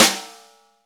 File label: snare, Roland